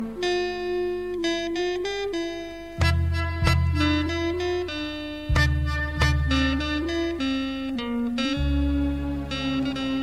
guitar n sitar ringtone; samsung ringtones

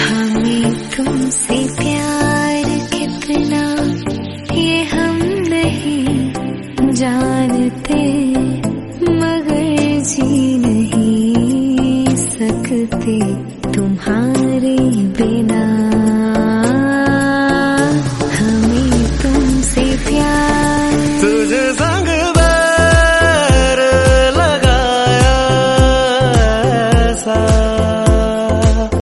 bollywood song ringtone

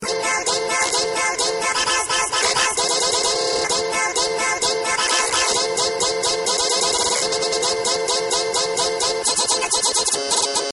jingle jingle ringtone

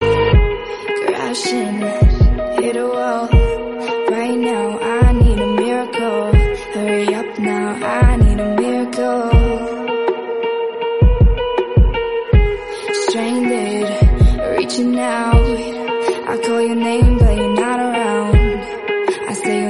sad background music tone ringtone

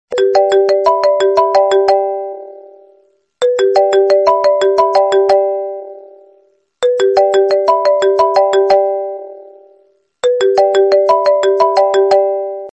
iphone sms ringtone

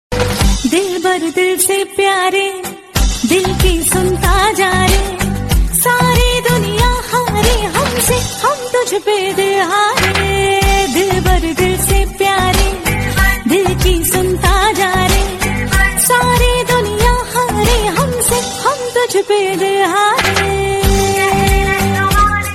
hindi song tone ringtone; bollywood

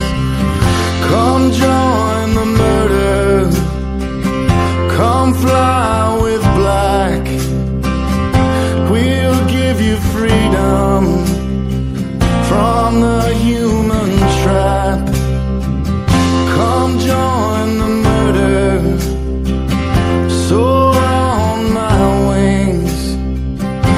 english song tone ringtone; english ringtones

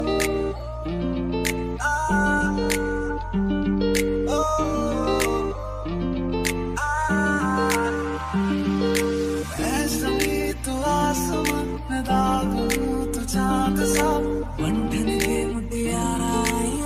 broken heart sad ringtone

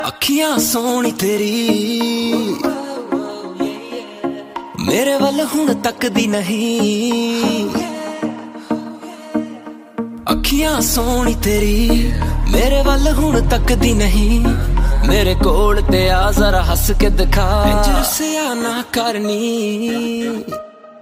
bollywood ringtone

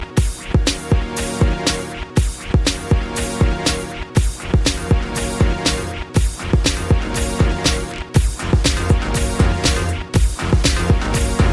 club beat ringtone

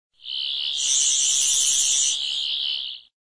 sonic screwdriver sound ringtone

